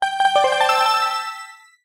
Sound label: Main Menu Start; Start the selected game (also selecting 'Continue Playing' from the Pause menu) (Recorded)